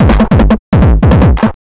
MD 909 150, Hard & Loud Techno

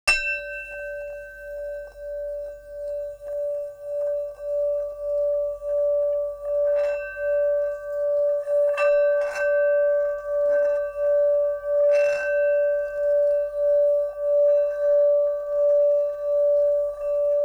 Singing Bowl; Sonic Foundry (Sony Creative Software); Continental Drift Aftershocks

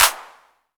808 CLAP; Drums In Your FACE!

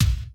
Kick, Yamaha RY20

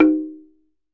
TUNG HI, African Perc

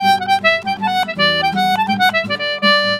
Celtic Flavours; USB Soundscan vol.42; AKAI

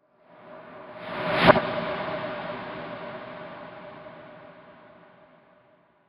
reverser

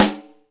Snare, Alesis DM5 Drum Machines

